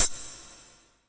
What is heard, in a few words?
FX Dance